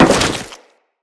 Crewman Step Goo, Footsteps; Enemies; Corpus

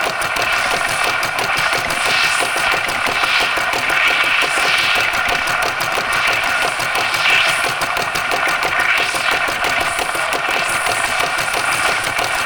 Interior Orb Station Console Loop; Warframe; Tau; Orbital Station

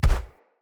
Step Default, Footsteps

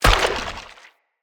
Jump Mushroom Activate; Puzzles; BeanStalk

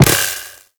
Arachnoid Wraith Web Proj Land; Warframe; Corpus; Abilities

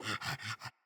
Puppy Idle Pant Grunt; Enemies; Vocals; Kubrow

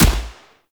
Assault Rifle Fire; weapons; Warframe; Grineer

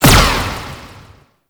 Galleon Turret Fire; Warframe; Ambience; Grineer Galleon; Vor Galleon Fight

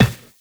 Run Glass, Footsteps; Warframe; Characters; Tenno

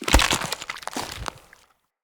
Pumpkin Destroy

